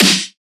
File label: snare slap; one shots; SEISMIC Drum Sample Pack; Slate